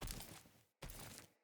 Foley Step Back; Garren Boss Arena; Deora

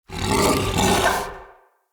Battle Dog Attack Bite Double, Animals; Vocals; Snc Battle Dog

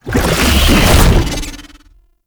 Latch Start; Roathe Summon Gulphagor; Warframe; Tau

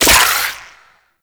Survival Toxin Pickup; Pickups; Inf Eris

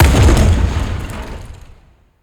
Siege Distant Explosion; Level Events; Midrath; Warsong Prologue

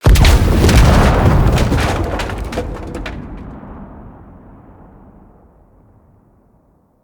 Siege Balloon Explode; Encounters

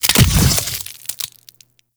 Infested Brain Cyst Explosion

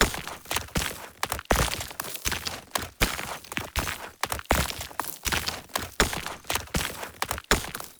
Wall Climb Movement Step Dirt Loop; Warframe; Oraxia

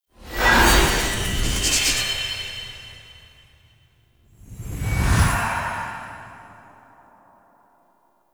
Title Card Base; Duviri

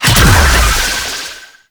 Balloon Mine Cast; Warframe; Follie Frame; Abilities

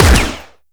Fire; Warframe; Latron Prime

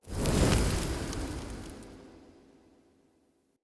Short Sword Fire Blade End; Weapons; Elemental

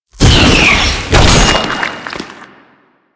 Kamikaze Flesh, Proto Infested Volatile Runner; Warframe

